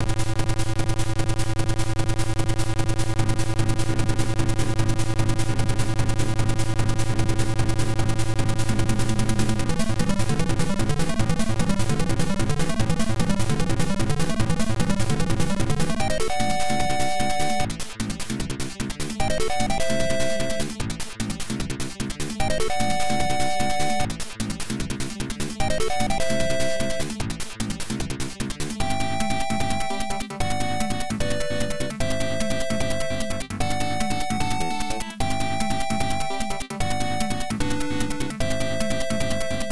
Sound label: Round BGM; Logical; PC-98